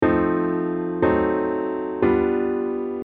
F dim

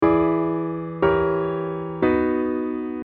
B dim

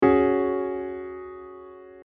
C D sus4

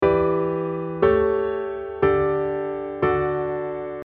D sus4

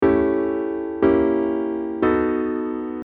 A dim

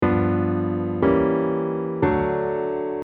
D dim

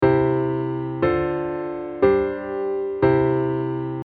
C sus4

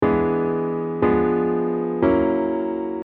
E dim

